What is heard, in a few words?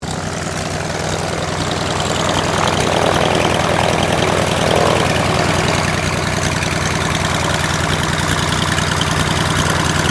AIRCRAFT CT4A IDLE, military trainer aircraft; Airplanes (Sound Effects:Vehicles)